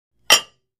Free Weights Exerci

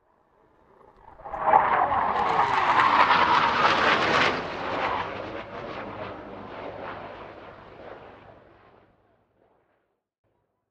Airplane Hawk fast overhead jet

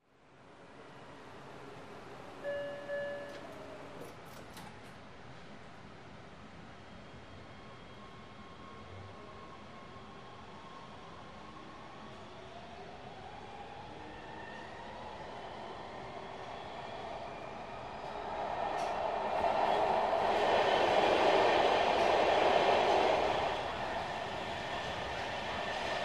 Subway Train Accel

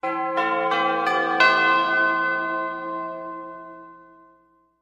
Music Logo; Tube Bell Chime For Announcements.

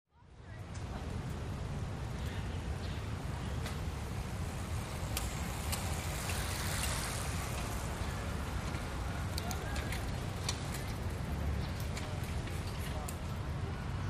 Bicycles By Close

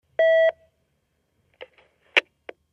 Intercom Beep Line Ha, futz intercoms

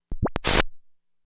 Tv Turn Off Mini Moog; fx & static

